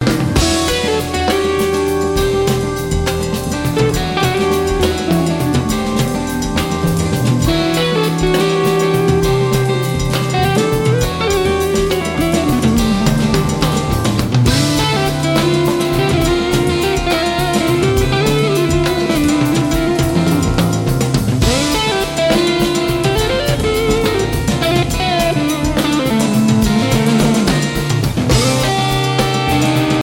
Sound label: New Instrumental